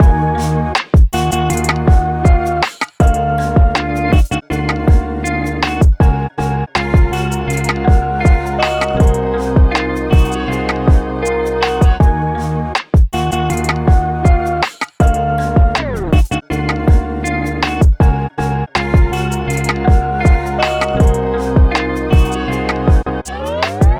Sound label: Vintage Dark